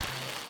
ui tab switch

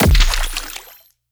skill harp bow hit

